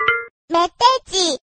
nada pesan notifikasi

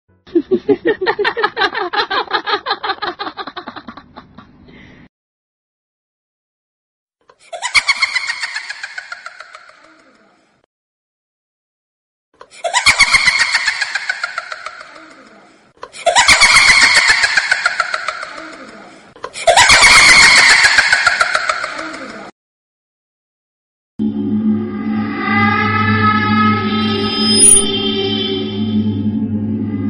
ketawa seram sound effect suara hantu

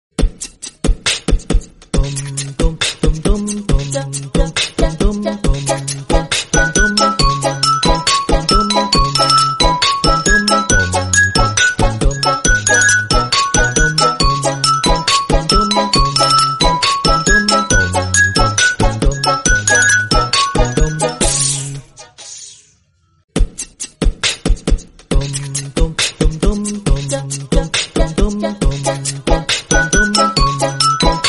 nada dering telepon, caller tone

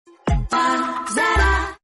nada dering tone

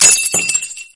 kaca pecah sound

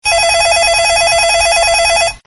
nada dering nokia 8210 nokia nokia 8210 nokia phone

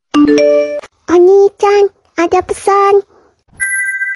suara pesan wa notification

